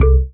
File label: marimba raincoat; ゆめ2っき